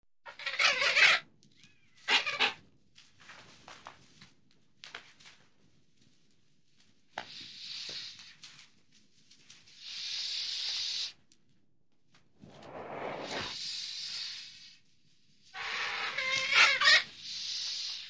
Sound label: Dragging a Goose